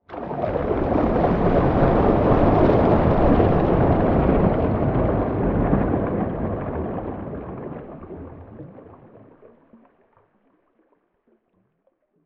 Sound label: Underwater Water Object Sink Splash Gurgle Bubbles